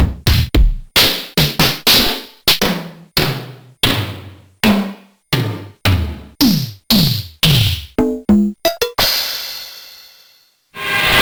Drumset Samples; Magical Date EX

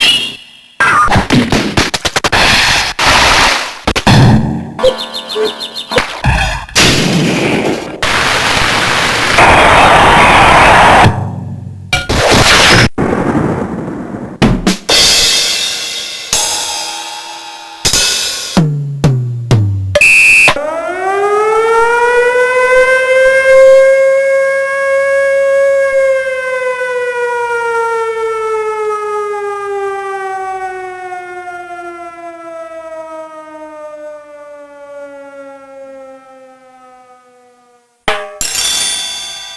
Drumset and SFX Samples

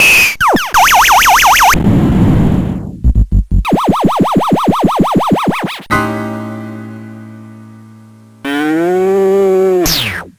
SFX and Drumset Samples

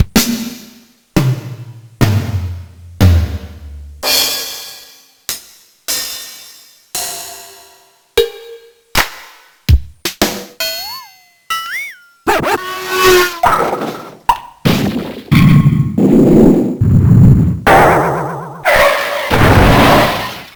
Drumset Samples; Crayon Shin Chan Orato Asobo

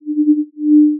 one type of sine wav

